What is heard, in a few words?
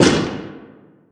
bang; bang sfx